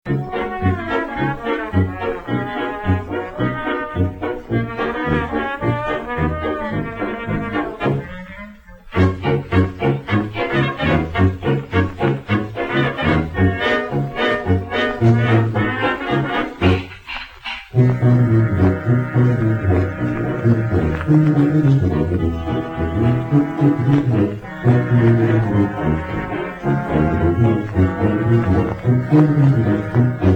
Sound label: Bass Blues